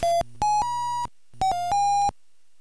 OCARINA

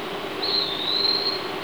pewee eastern wood; birdsongs

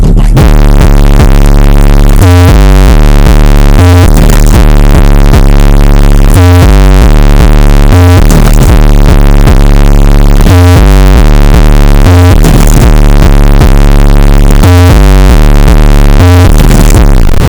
white purr supreme

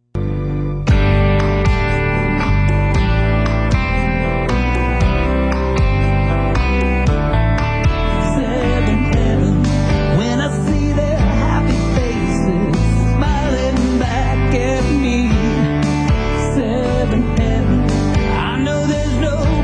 Theme Song; 7th Heaven

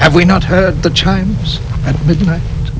chimes

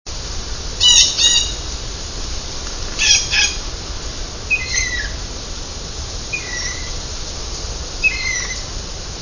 bluejays; birdsongs

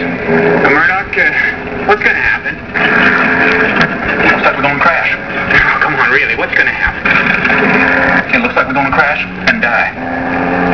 crash die

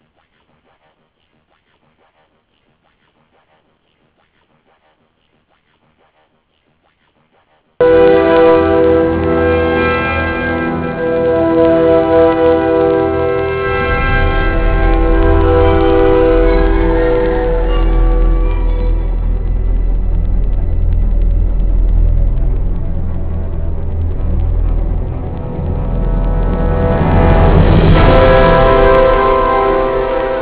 music

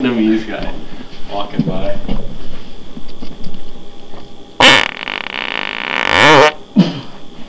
powerful, farts

